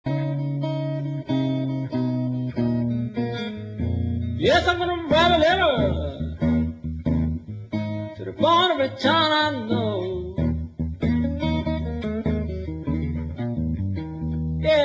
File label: blues